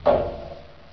snail hat bounce; the-hat-chooses-the-wizard

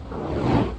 Flame Move; the-purple-night

